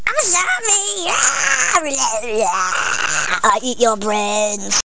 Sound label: Zombieeee